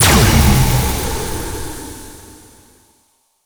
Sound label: Portal Shatter Open; Ambience; Props; Warframe; Duviri